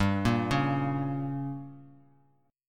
G Diminished, Bass Standard; arpeggiated; JGuitar

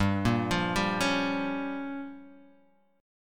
A sharp Minor 6th G

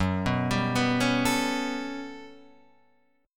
G Major Sharp 11th F sharp, chord

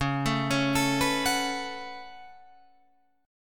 Db 7th Suspended 4th Sharp 5th Db Guitar Open F; arpeggiated

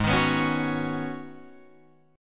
G sharp Minor 11th G sharp Guitar Drop D, chord

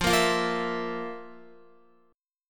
F Major 7th Suspended 4th, Guitar; strummed

